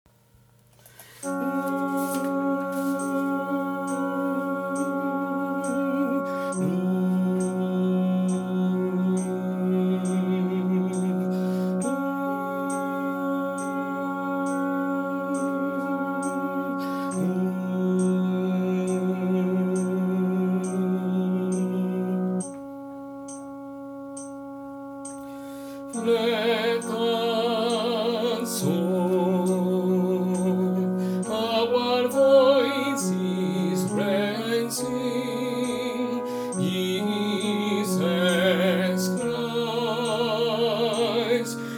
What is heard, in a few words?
Tenor